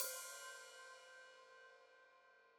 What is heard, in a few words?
Hat Open, Hi-Hat